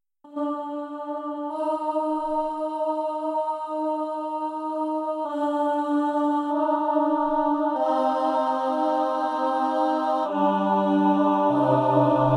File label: Sanctus SATB, Messa a cappella; MESSA BREVE - A SHORT MASS